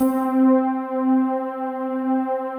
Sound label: PAD, DIGI-PAD